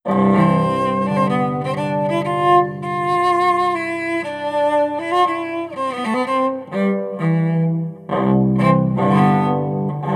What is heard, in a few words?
electric cello classical sequence